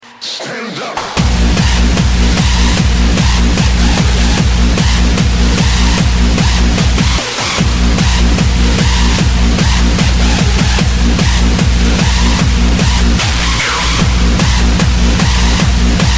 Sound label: Hardstyle Scream